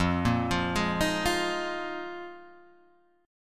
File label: D sharp Suspended 2nd Suspended 4th